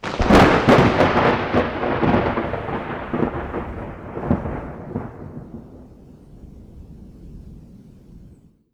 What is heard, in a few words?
thunderclap; sound effects and sound reinforcement; The Passion of Dracula